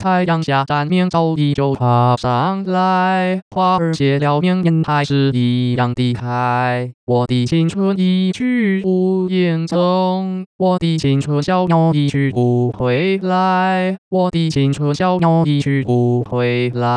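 Synthetic singing voice (male), Pitch coartcltn, Chinese; [太陽] 下 [山明] [朝依] 舊 爬 [上來], / [花兒] [謝了明年] 還 [是一樣]; Mandarin Singing Voice; Pitch Coarticulation for Mandarin Singing Voice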